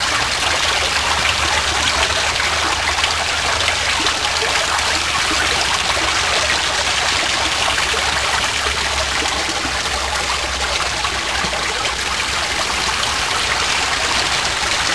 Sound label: water drain